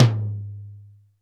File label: DRY, R8 DRUMS; Crypton - DrumBase5000